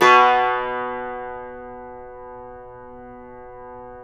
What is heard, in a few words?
CUMBUS B, Guitars